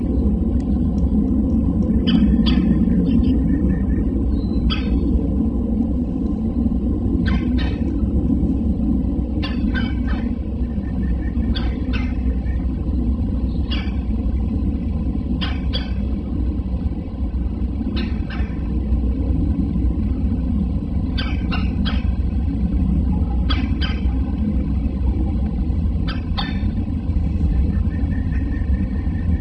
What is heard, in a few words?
altitude ambience